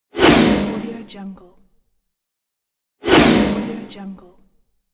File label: swoosh